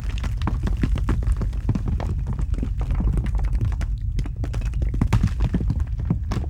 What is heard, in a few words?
RockBoulder Move